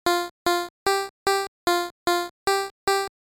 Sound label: Unused Jingle